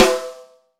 Royality free steel snare drum sample tuned to the B note. Loudest frequency: 1607Hz; long snare